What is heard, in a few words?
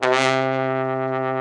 TROMBONE; Best Service Gigapack 1